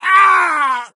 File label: death